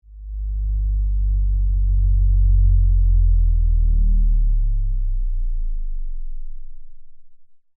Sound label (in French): Crissement traf; design sonore